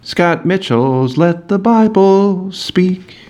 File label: Jingle Attempt